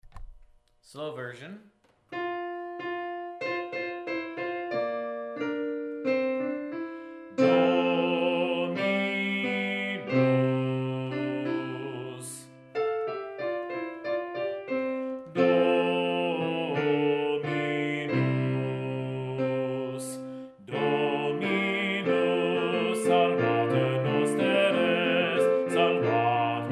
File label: Slow Bass; En Natus Est Emmanuel-Slow, M. Praetorius; Choral Resources